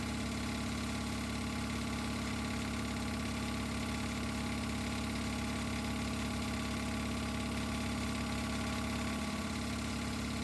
light machine

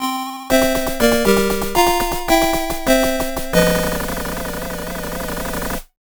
Game Over - Time Over (8-Bit Soundtrack); Sonic the Hedgehog 2 Anniversary Edition; genesis

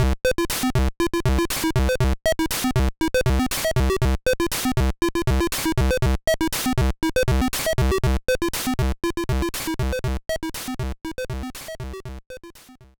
BGM; Bit Generations Boundish; gba

